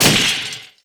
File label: Enemy Glassy Common Delete; gamerip